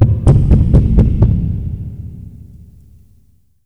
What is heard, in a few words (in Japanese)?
dondon; ドンドン; 激しくせまる足音？ 厚い扉を叩くような音？; ホラーボイス・声ネタ・効果音・ＢＧＭ